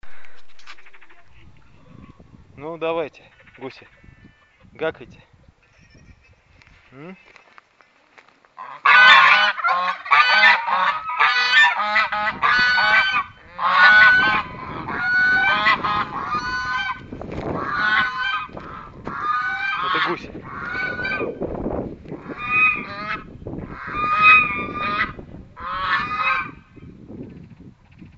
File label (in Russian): gusi